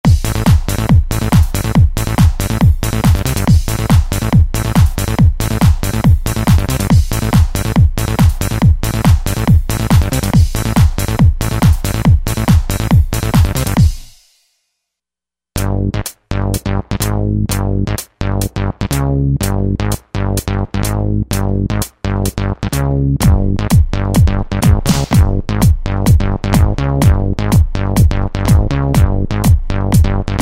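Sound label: Extra Dance KIT; Roland V-Synth; Factory Patches; 451 ExtraDanzKIT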